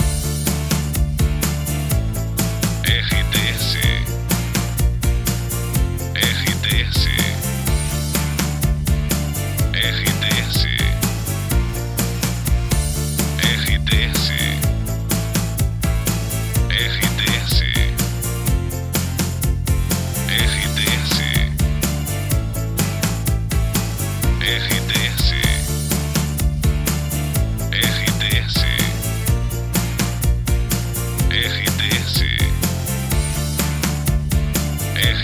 trilha para locução; Rádio Panorâmica FM de Campina Grande, Paraíba, Brasil

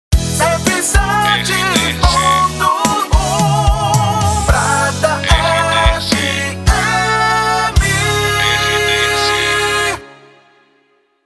PASSAGEM RADIO COM SERTANEJO

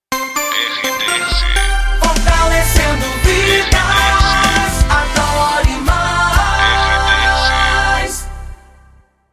PASSAGEM

